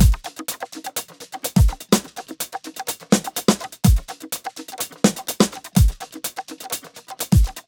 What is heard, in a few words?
125 open, Nu Jazz House Beats